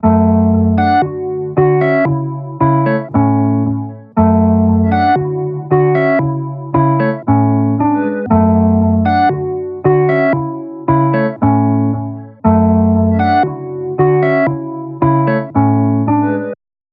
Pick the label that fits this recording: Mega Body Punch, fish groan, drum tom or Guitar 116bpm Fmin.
Guitar 116bpm Fmin